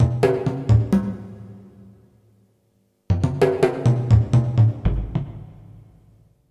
Music Game Exploratory Sting

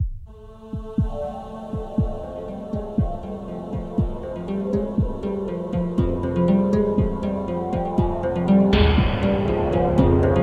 Music Game Titles Flyby Music Only

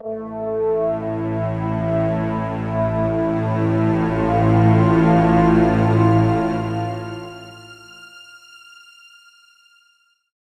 Music Game Haunting

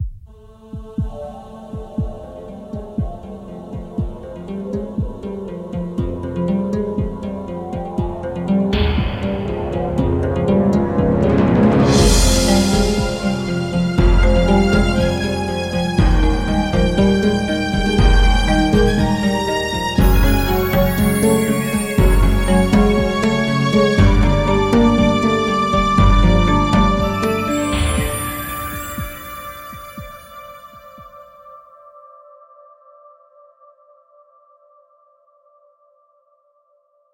Music Only; Game Titles Flyby

